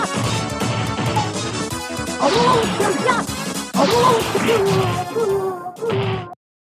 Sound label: speed up; Super Street Fighter II